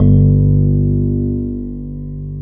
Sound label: JV SENSITIVE, JV-1080, PIANO